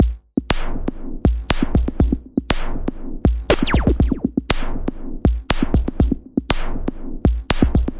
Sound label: 120 flubby gated electro, drum loops and breaks